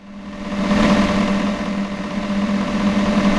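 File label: Fan rotation start, Stove Air Blower; Stove #3 Air Blower; ARDI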